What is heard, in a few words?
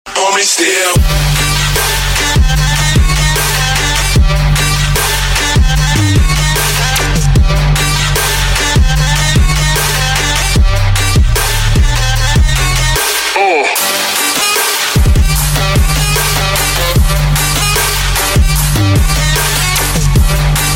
Trap ringtone